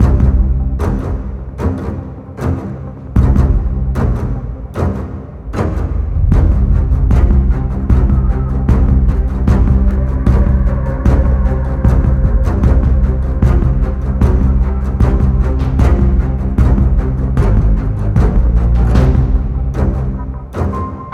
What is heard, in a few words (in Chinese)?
节奏紧张